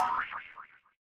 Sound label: cartoon boing